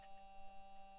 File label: mic, mars2020 edlcam